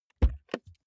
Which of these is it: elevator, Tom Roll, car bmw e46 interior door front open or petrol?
car bmw e46 interior door front open